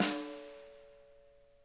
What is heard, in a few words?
RIM, snare; Sonic Reality; Interactive Drum Kits